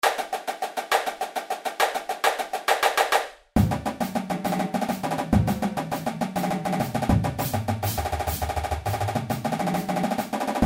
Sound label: Triplet Dogs 136 bpm